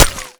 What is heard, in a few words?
concrete small